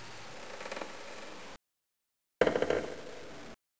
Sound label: creak